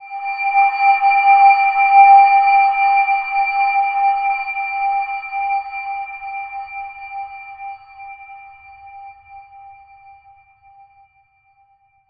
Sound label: Silver Gem G5 f; healing soundscapes